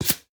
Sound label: bullet by supersonic tail; Counter Strike Model Pack; CS2